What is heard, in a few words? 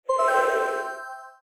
Revive heal